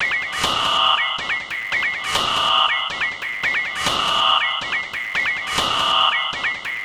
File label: Machine SFX Loop; Patterns; Electronic Point Blank